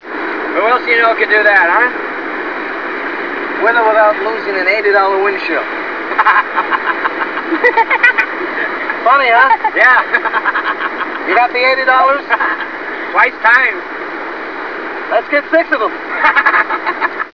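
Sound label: windshield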